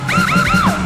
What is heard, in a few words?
ahhh